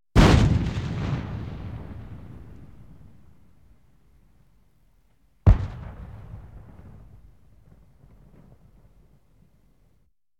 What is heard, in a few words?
Tank M1 Firering + Impact; KMP Film Video Sound Effects